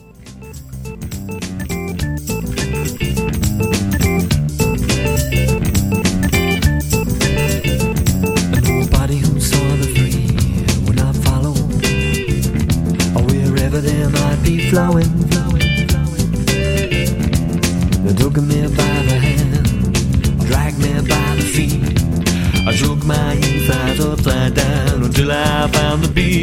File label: Drumsong